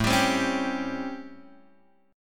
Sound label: A 7th Sharp 9th A down Guitar Open G